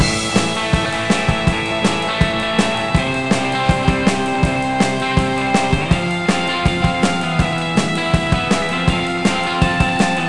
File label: Music Cave; Beach Buggy Blitz; Android